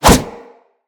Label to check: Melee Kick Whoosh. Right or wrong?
Right